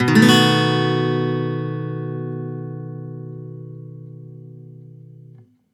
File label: One Shot B 7 downstroke, Acoustic Guitar; One Shot Chords; Sonic Foundry (Sony Creative Software); Songwriter Acoustic Guitar Companion